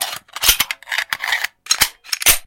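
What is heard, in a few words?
magazine change on a small automatic rifle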